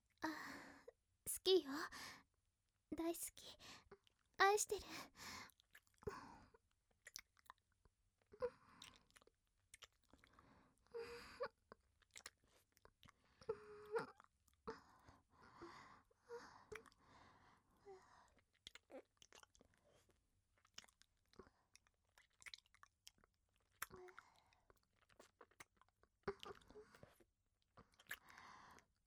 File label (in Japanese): キス 右; 甘い恋人とのテレホンセックス